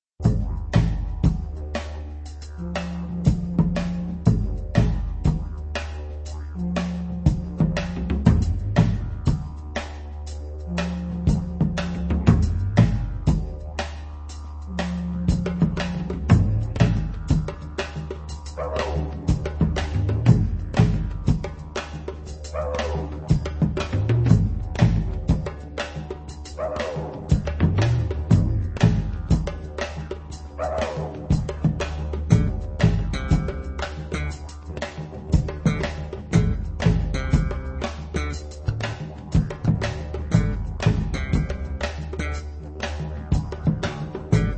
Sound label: trance